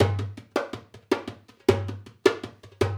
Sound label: Dumbek; Drums; Sonic Foundry; Essential Percussion